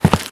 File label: Stone footstep; starbites